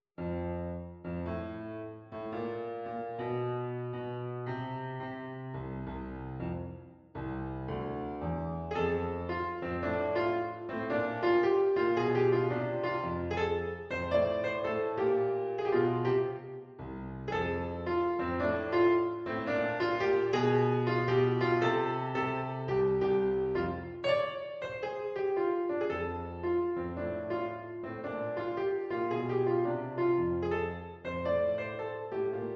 PIANO

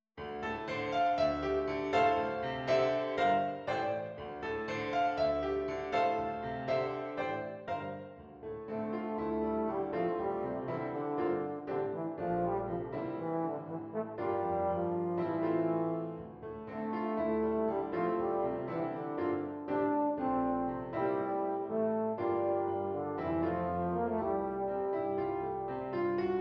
Beautiful Mind Euphonium

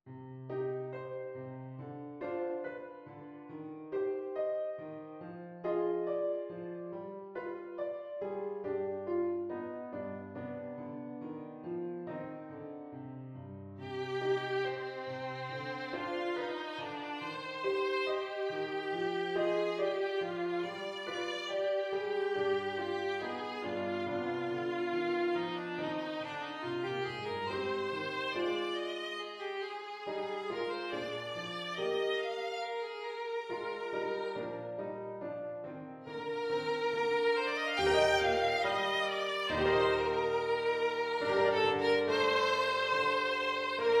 Violin

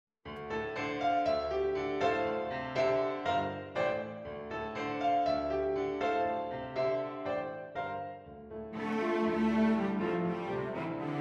Cello

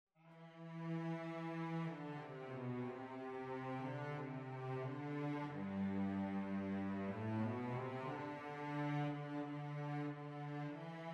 string, string trio

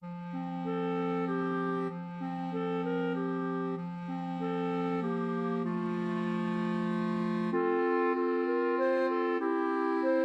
Clarinet Trio

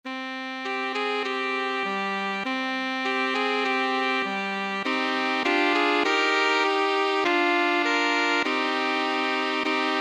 sax trio; saxophone trio

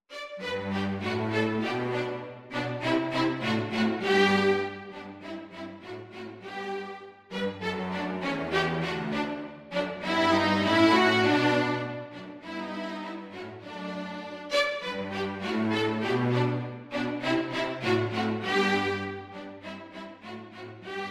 Allegro for string trio; mozart

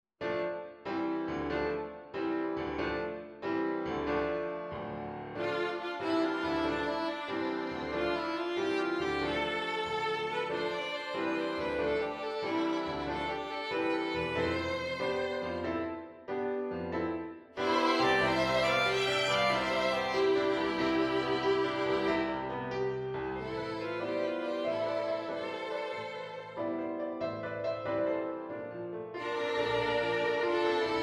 double violin; No.8 Changing Skies; double violin series set 2

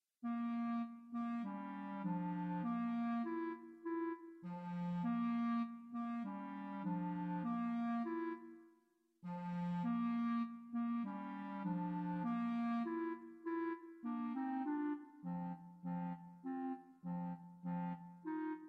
clarinets quartet

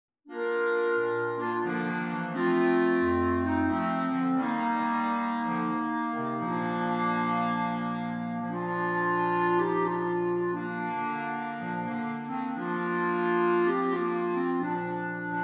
Jazz clarinet; Silent Night

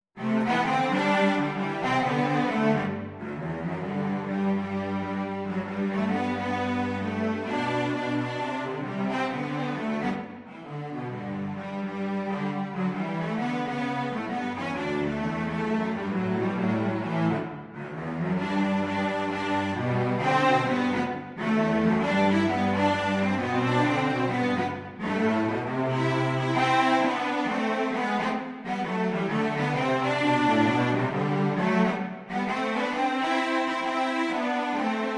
jazz Cello Duet, swing; hark the herald angels swing

